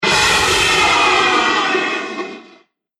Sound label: Confined Space Explosion; Bomb Sound Effects